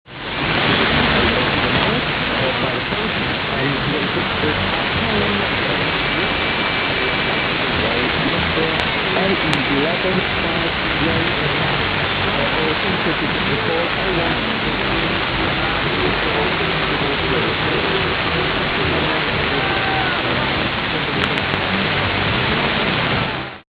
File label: Carillon